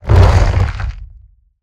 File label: Roll Surface End; Evolve; Behemoth